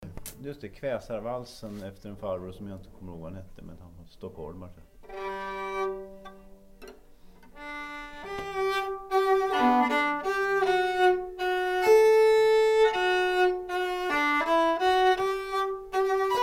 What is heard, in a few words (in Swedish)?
kväsarvalsen